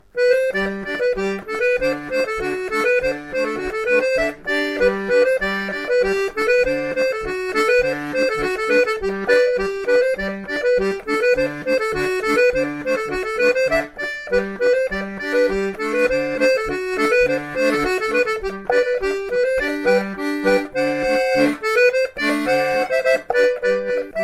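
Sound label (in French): polka mexicaine, accordéon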